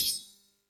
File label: whoosh